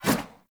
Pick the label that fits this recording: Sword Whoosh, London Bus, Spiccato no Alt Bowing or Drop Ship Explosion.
Sword Whoosh